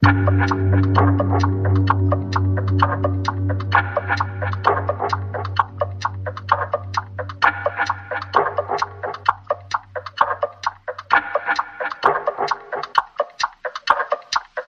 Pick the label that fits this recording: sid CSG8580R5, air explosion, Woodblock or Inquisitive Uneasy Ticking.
Inquisitive Uneasy Ticking